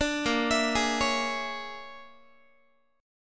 A sharp 7th Sharp 9th Flat 5th, Guitar; arpeggiated; chord; JGuitar

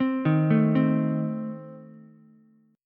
A Diminished A 5,3,5,3 down Ukulele; Adim arpeggiated; JGuitar

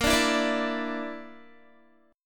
A sharp Major Flat 5th, Guitar; A#Mb5; strummed

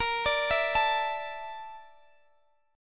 Bb Major 7th Flat 5th, Guitar, Open G; x,x,20,19,17,19

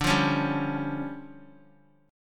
D Minor Major 7th Double Flat 5th, D, Guitar; DmM7bb5; strummed; JGuitar

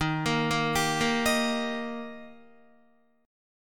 Eb Major, down, Guitar; arpeggiated; 4F,4A,5C,5G,5C,6E

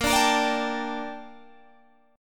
Bb Major 13th, Guitar, Open G; 20,19,20,19,20,19

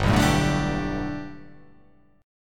G Major Add 9th chord

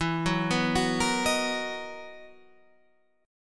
B Major 7th E

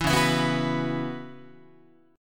C sharp Minor 11th, Guitar; C#m11; strummed; JGuitar

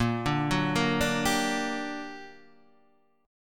G Minor Major 7th, A sharp, guitar chord

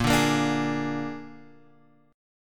Bb 7th, Guitar, Open G

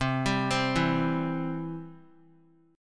C Major; arpeggiated; JGuitar

